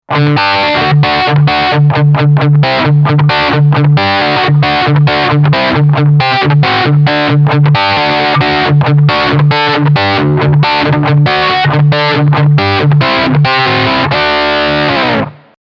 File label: gretsch g5623 cb